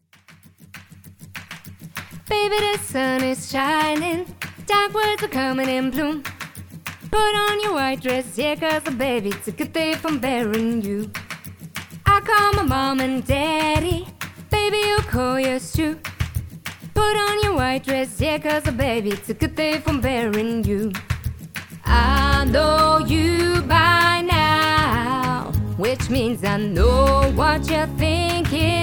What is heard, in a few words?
Multiple Voice